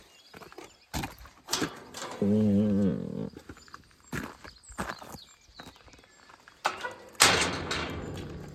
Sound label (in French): PORTAIL CIMETIERE ENTREE; LE FORET DE TESSE 16